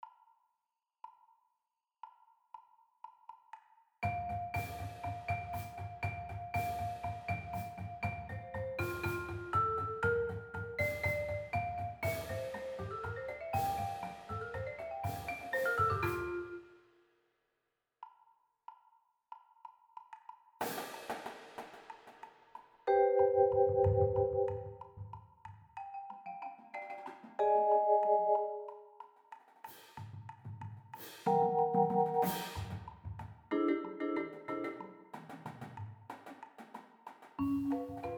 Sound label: Perc Tryout; Full Ens; rolla-bands